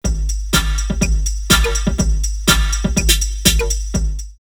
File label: drumloops Rave 123; X-Static Goldmine; 90 s Sample CDs